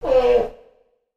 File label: sea lion